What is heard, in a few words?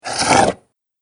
Meaty Monster Bite; Monster Sound Effects